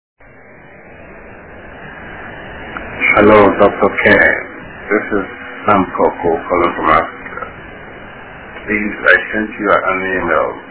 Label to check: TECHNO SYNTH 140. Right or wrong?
Wrong